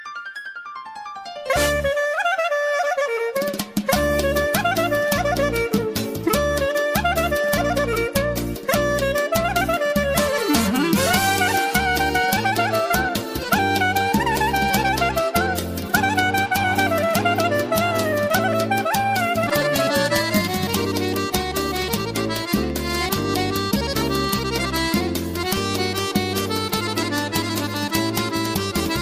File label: Armenian